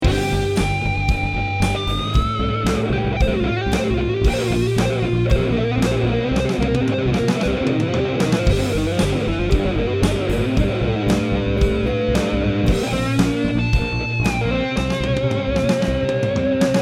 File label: solo